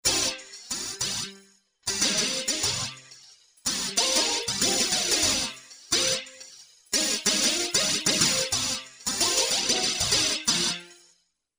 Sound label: Sparkling; Korg MSC-14S Sound Effects